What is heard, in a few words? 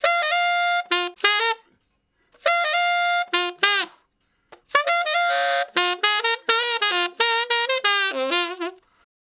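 Tenor Sax Straight (F); Solos; Sonic Foundry (Sony Creative Software); Horncraft for R&B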